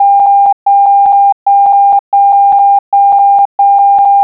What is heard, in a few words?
CQ Beep